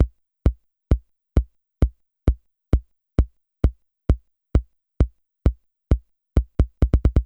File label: Beat, Old Skool House, 132bpm